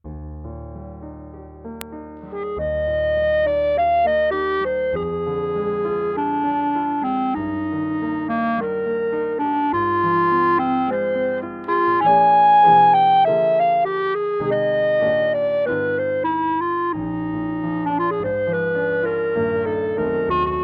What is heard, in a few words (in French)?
brahms Synth, clarinette